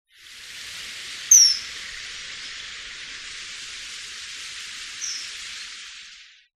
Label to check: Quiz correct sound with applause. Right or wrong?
Wrong